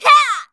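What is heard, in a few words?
MON ATTACK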